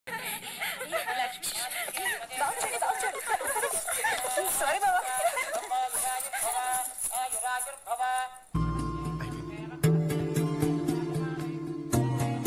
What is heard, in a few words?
emotional bgm; arya